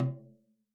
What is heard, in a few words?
no snare, sticks; SoundBytes - Early Patches